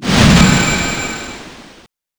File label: spell stealth; Garry's Mod; tf2